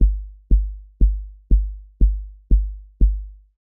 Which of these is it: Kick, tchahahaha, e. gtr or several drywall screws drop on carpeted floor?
Kick